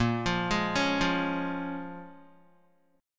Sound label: Bb-Minor 7th-Bb Guitar; Bbm7 arpeggiated